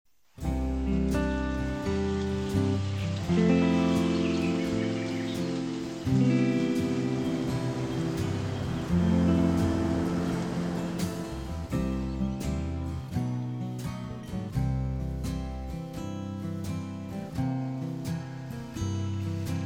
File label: intro birds and waves faster